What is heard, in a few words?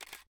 camera click